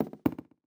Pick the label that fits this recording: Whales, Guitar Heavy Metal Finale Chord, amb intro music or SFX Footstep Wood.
SFX Footstep Wood